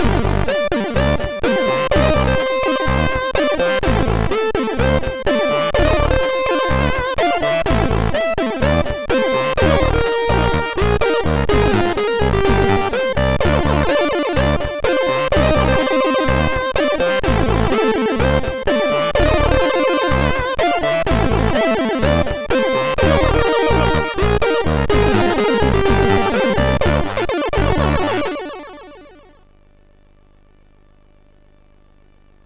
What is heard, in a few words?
30 Seconds Jingle, MOS6581R3; sid